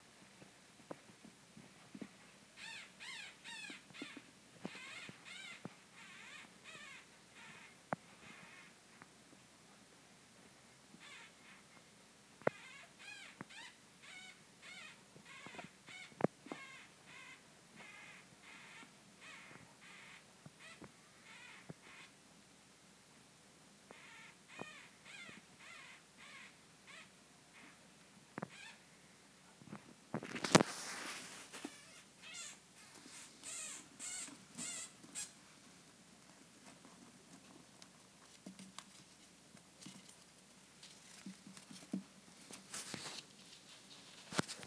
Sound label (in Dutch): geluid, syrische hamster nestje